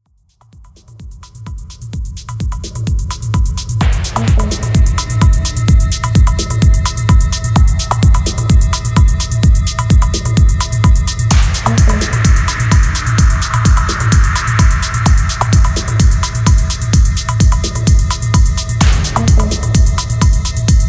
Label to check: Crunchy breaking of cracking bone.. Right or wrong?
Wrong